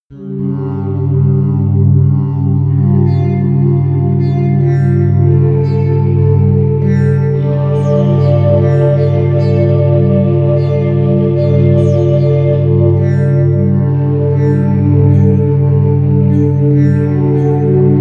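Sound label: erratic sitar, pads; flaechen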